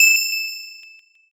handbell

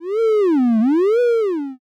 metal strain

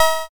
cowbell

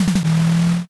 engine start

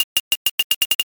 hyoshigi roll

